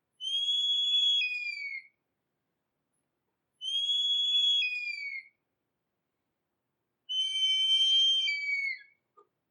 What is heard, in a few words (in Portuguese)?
Canto da Harpia